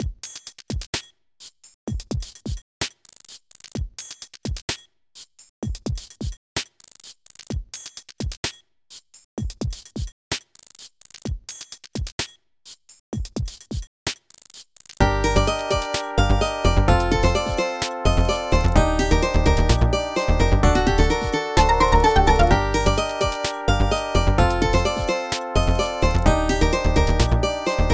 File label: Hip-Hop Stage (Karaoke); pinky street kira kira music night pop town gamerip